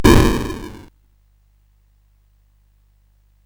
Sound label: sid CSG8580R5; Rescue on Fractalus